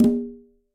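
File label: Tumba B Flam Open; Latin Percussion; Drums; Drum Hits; Conga; Ableton; Factory Packs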